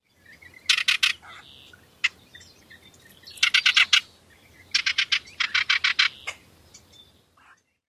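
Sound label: churr calls, bird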